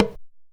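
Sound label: small tama hit; Best Service; World Colours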